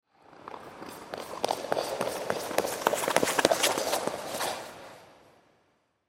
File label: Ice Skate Run By, Ice Skate Run By Foot Work Rink; Ice Skating; Sports; Blastwave